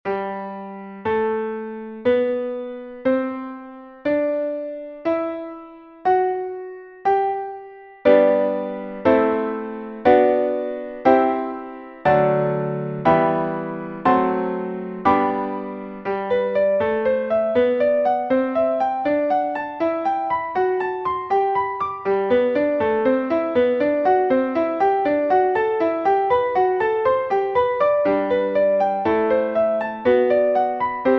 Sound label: Chord Shapes Basic Scales And Triads G